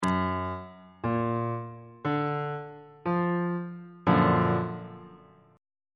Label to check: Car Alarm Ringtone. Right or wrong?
Wrong